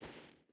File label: footstep barefoot sand walk; Rust